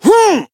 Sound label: Voice attack